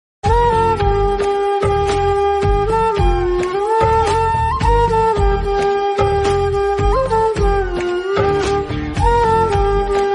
song flute bgm ringtone hindi ringtone love ringtone